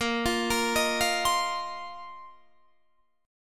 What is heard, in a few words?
A sharp Suspended 2nd Suspended 4th down Guitar Open D